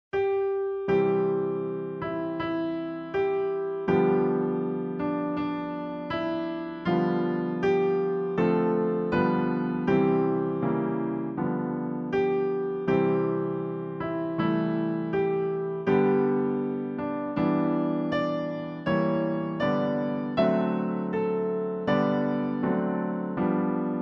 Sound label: instr; America the Beautiful; folk